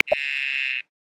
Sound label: Blue Poison Dart Frog, Amazon; Xiaomi Redmi-Note-8-Pro